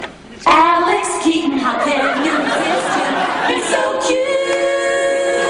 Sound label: jingle short